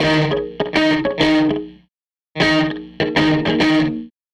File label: Strat Guitar Loop